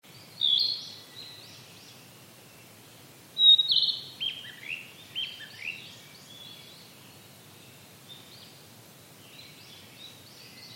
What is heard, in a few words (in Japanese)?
kibita